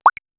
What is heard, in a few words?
one shot game sfx, liquid